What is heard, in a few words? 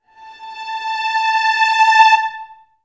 VA CRSC A4, Advanced Orchestra; Best Service